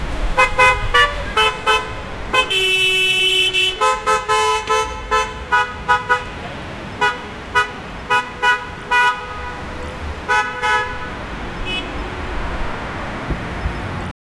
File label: bustling city horns sound